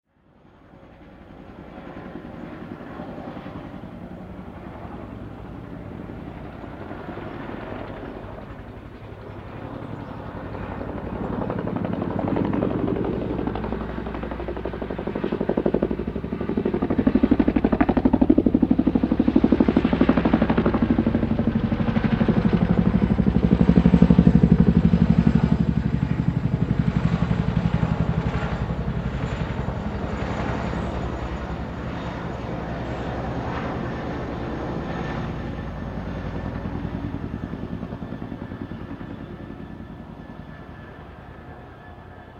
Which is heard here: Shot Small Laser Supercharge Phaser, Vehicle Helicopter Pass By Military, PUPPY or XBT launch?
Vehicle Helicopter Pass By Military